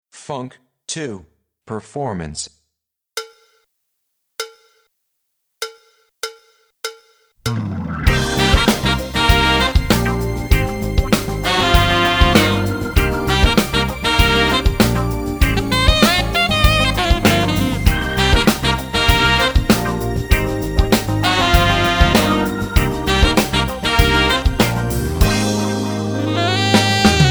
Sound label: Sample FUNK, drum